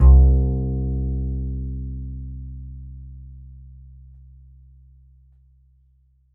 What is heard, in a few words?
A, upright acoustic bass, A string